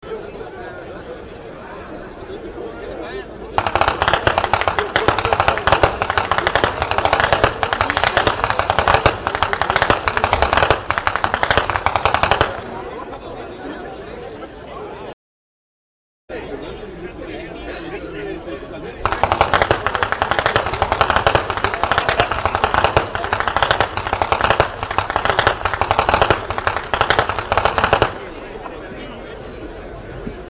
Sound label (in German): schnalzen; ainring